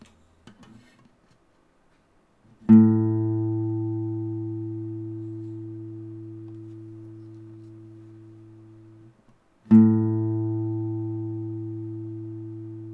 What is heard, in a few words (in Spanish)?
Sonido de guitarra acústica cuerda 5 La A